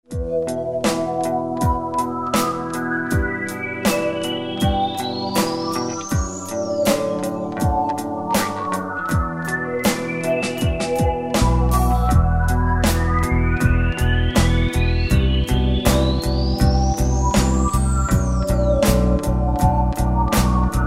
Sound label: Synth